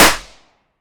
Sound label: confetti; gmod; arccw uc commons attachments